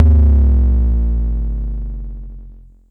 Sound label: BASS MOD, Dual Percussion Synthesiser; Klone